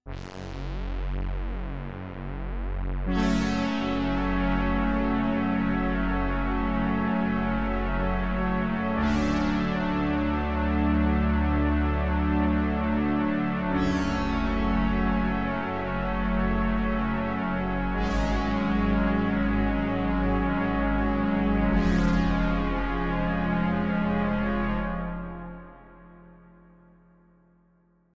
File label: roland jupiter 8